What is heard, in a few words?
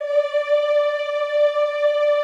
EEH D4, Classical Choir; Best Service; Extended Classical Choir